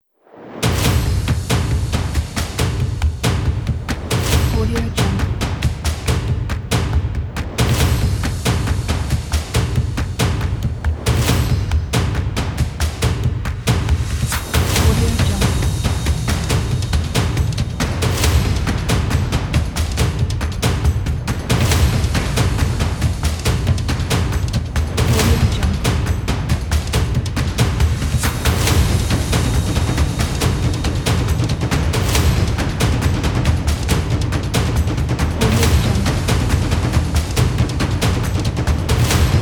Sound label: action drums